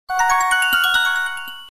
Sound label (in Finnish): huawei tekstiviestiääni